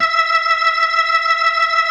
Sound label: E5F, B3